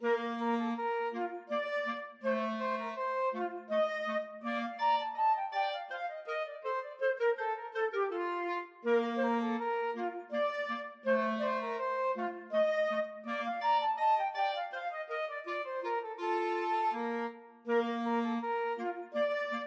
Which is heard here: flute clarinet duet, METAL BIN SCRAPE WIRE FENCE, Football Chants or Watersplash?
flute clarinet duet